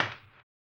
HAMBONE, Vocal Blues Soul

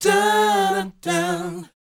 BOYZ D, Vocal Planet R&B & Dance; ILIO

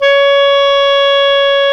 WND CLAR2, Clar Jazz

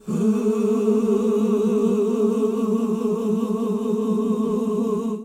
OOH A2, GOSPEL; Best Service; Hallelujah; GOSPEL 10

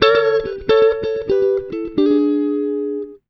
Blues, Electric & Acoustic Guitar Loops

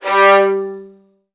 G1, VN 16TH, Virtuoso Strings; ILIO; Kirk Hunter

